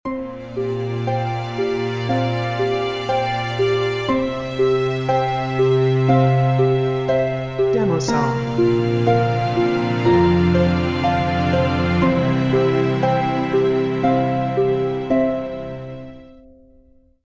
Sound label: Soft Bright Intro or Happy Ending